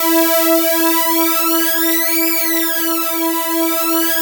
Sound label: PHAT ACID E5; PHATYACID